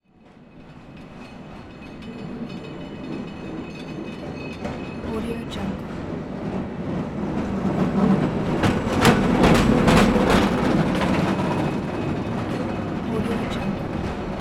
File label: cable car pass by; تله کابین; urban sounds